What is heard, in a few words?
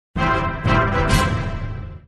Goal